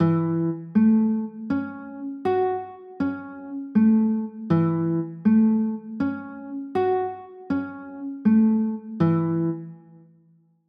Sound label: f sharp m7, chords